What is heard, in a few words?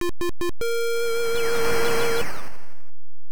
Fanfare